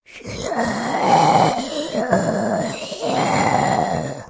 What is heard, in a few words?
Zombie; Blind Survival